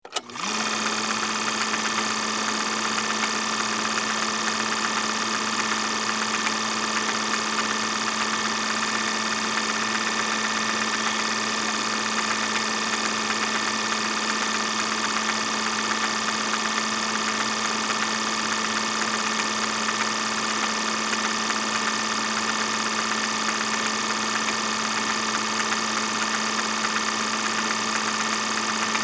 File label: Film Projector; Film Projector Turns On, Runs, Turns Off; Projectors; Offices